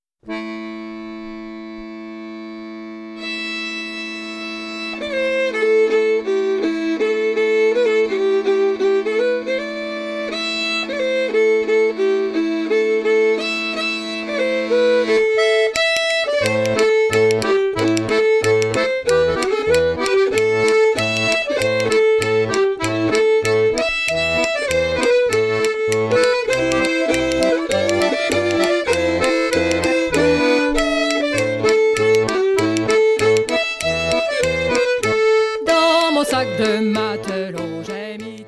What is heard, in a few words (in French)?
l harmonica